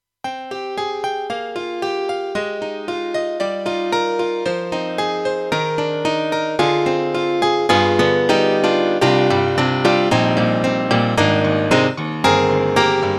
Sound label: YAMAHA TG300 GM-B E. Grand